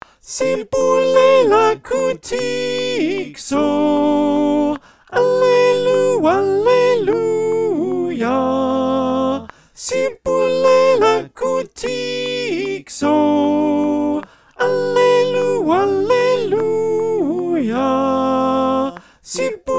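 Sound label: soprano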